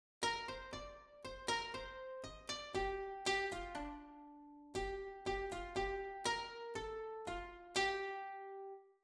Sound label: aeolian